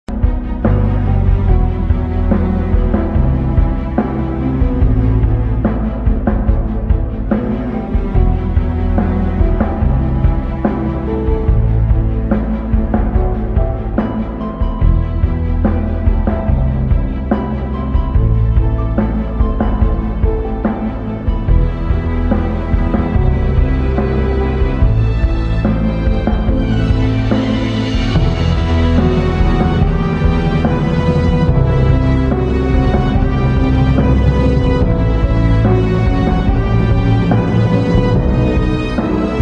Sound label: instrumental ringtone